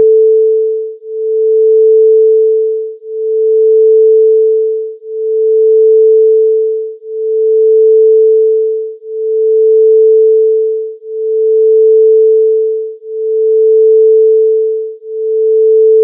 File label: demo signal